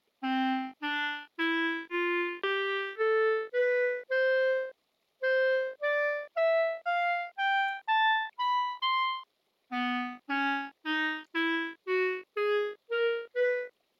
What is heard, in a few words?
Basic Clarinet Sound